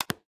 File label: square lock; LG G6